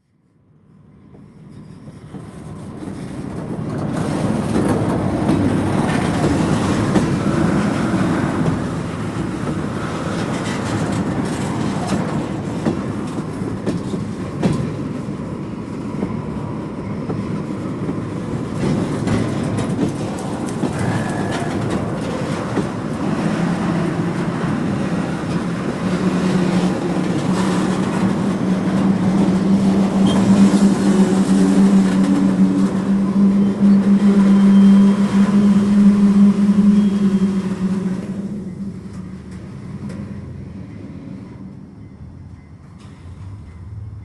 freight train richmond; Sonniss GDC

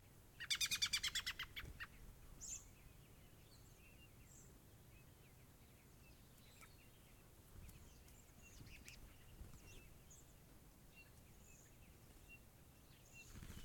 dawn robins; medlock

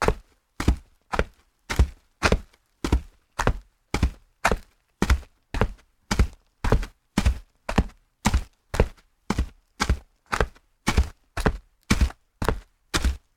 footsteps gravel loafers loops walk normal; sonniss gdc

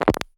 switch button click high tech interface; sonniss gdc

